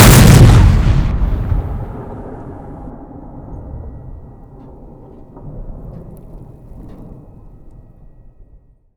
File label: cannon fire, M1a1; WarRock